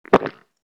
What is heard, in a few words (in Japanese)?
gokkun, ゴックン音のみ; 射精; 膣内射精